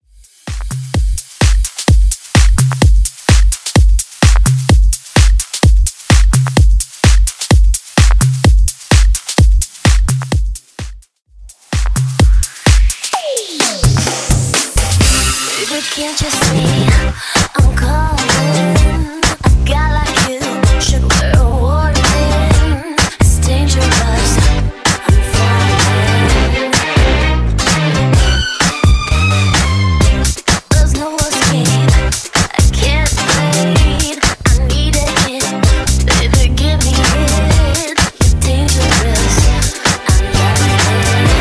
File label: 128bpm; Epic Editz